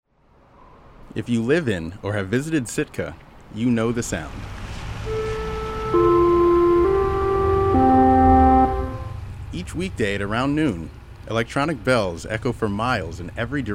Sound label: siren